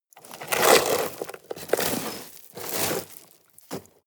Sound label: shovel dig